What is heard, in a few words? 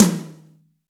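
Room Tom, Roland R8 MKII drums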